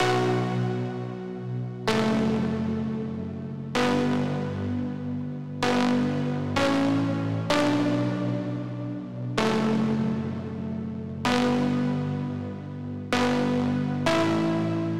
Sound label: 128 BPM B Min Chords, House; Loop Stems; Cymatics; Believer; Breakdown Loops